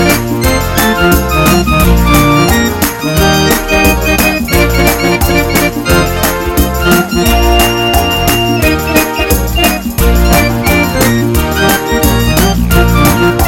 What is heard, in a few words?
Smooth and Happy